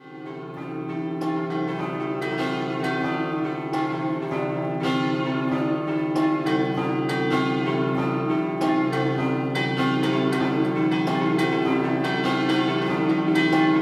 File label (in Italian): Castions Di Strada Scampanottata, campane